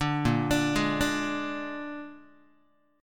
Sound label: Bb 7th Bb down Guitar; 5,6,5,6,6,x; 4A,4E,5A,5D,5Gsharp,6Csharp; Bb7 arpeggiated; JGuitar